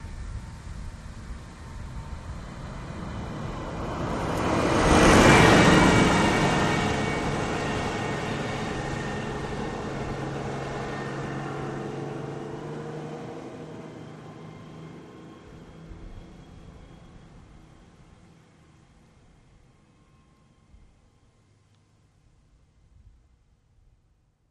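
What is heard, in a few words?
City Bus By Long Out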